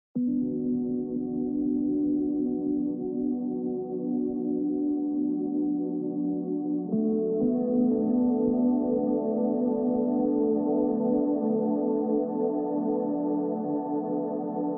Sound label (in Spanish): ATMOSFERICA